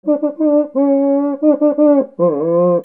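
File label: percussion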